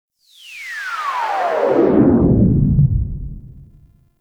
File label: Sentient Search Drone Spotted Stinger; Warframe; Tau